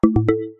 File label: tam-tam